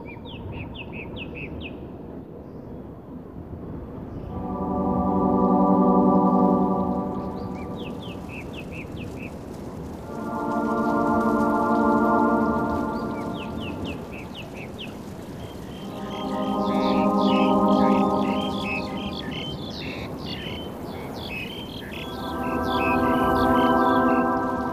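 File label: Ambience Wind Ville; Ladianes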